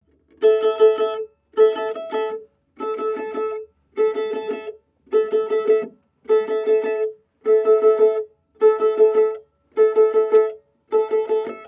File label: riff; olofonia